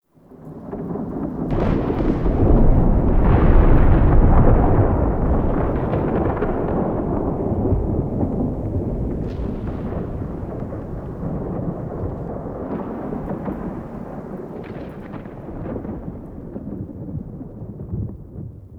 Murmur Exterior Rolling Thunder B; Warframe; Ambience; Duviri

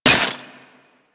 Melee Impact Glass; Warframe; Weapons

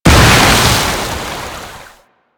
Water Tomb Explosion; Warframe; Yareli

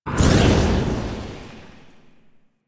Void Beacon Activate; Warframe; Missions; Zariman; Zariman Assassinate

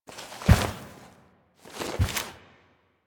Rises Stand Foley; Warframe; Sacrifice; Sacrifice Umbra Rises

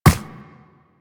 Run Ice, Footsteps; Warframe; Operator

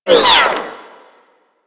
Corpus Energy Projectile By

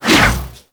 Ball Throw Whoosh; Warframe; Missions; Tenno Ball

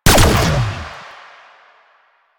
Fire Shot, Weapons; Duviri; Incarnon Adapters; Incarnon Cestra

